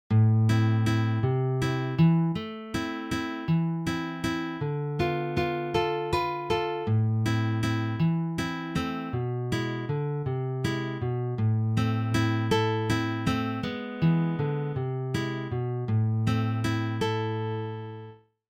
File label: POETIC WALTZ